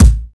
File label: shiny kick a key, kick drum sample tuned to the A note. Loudest frequency: 710Hz; kick drum samples; drum single hits one shots